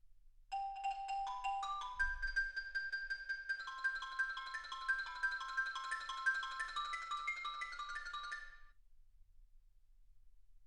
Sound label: xylophone